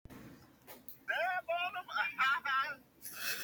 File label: ah haha